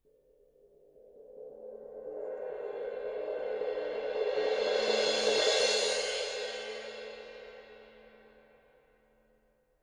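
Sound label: suspended cymbal roll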